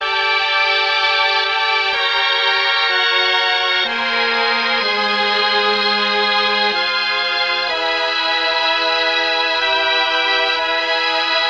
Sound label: mellotron practice